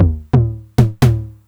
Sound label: 909 mod MT - MID TOM